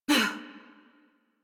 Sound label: Pain Adult; Warframe; New War; Adult Operator